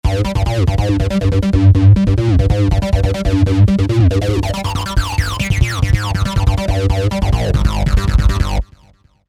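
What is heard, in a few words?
TB Square Dist Bass, v-synth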